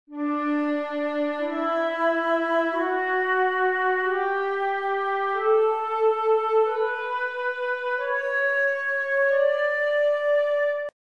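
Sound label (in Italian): re maggiore, scala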